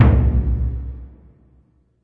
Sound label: big burundi, African Burundi Drums